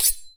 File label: General Button; netent